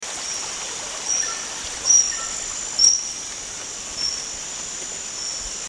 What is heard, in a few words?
black backed kingfisher